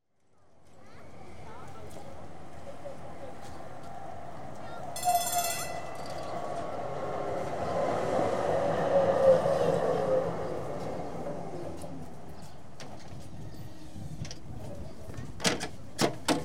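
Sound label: tram exterior approach, doors open; Transport; BBC Sound Effects Library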